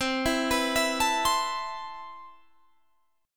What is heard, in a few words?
C Major 13th, Guitar Open G, chord